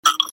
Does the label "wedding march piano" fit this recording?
No